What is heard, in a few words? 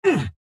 Hurt